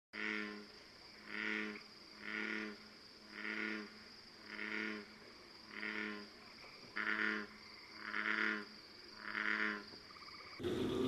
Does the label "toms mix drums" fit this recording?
No